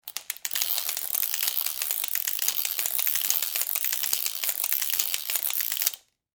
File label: Industry Dual Ratchet Turn Fast Clicks; Hand Tools (Sound Effects:Tools)